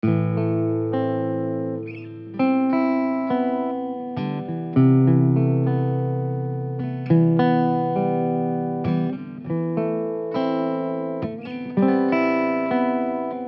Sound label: Blues